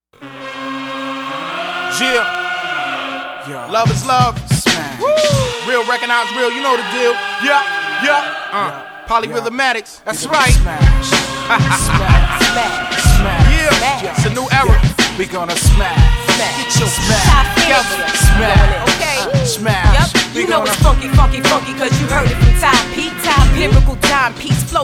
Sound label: polyrhythm break glass